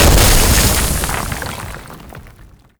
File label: erupt, antlion dig; creatures